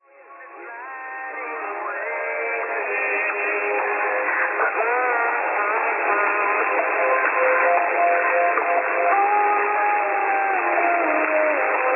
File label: USB; 6925 kHz; Spider